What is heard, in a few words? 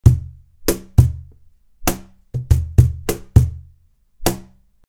cajon loop 100bpm; creaktor; mozee